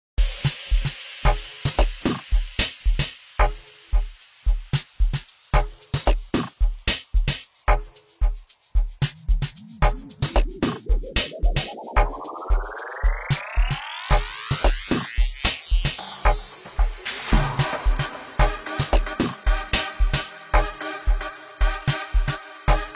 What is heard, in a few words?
bells funk